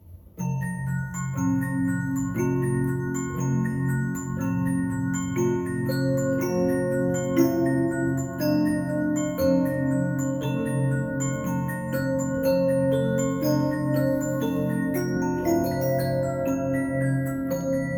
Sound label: Reginaphone Oak Short bedplate